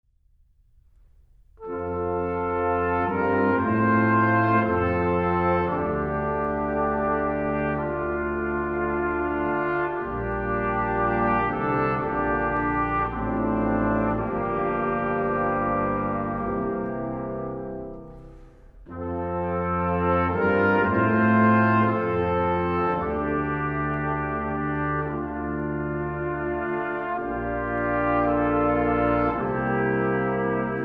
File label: In the Bleak Midwinter, Brass Ensembles, Christmas; Holst